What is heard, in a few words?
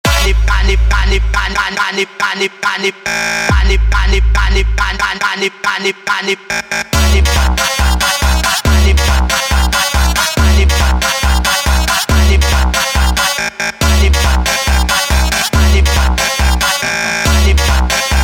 Phonk speed Up speed Up